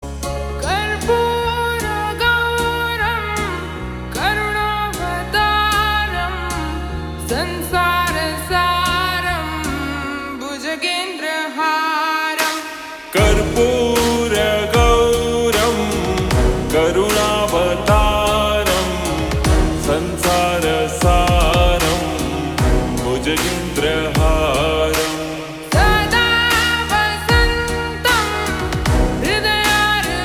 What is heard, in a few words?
Slowed Reverb